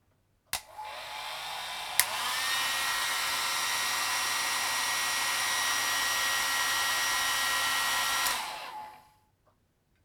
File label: machine; ドライヤー２